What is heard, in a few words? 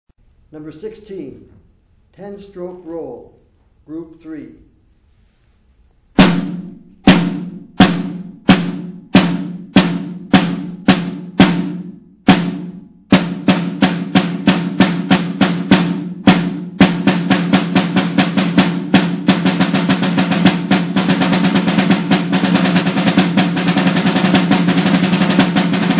snare rudiment